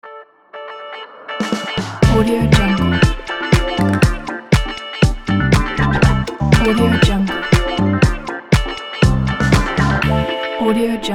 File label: stylish vlog podcast opener ident